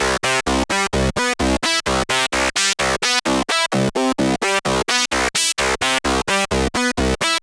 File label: synth